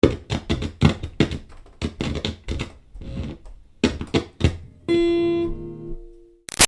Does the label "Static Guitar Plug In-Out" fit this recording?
Yes